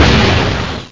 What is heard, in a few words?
LAUNCH